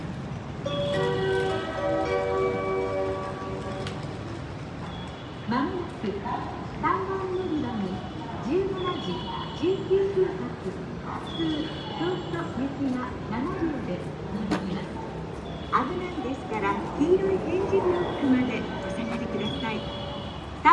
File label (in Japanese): 神戸線 六甲道 東, train sounds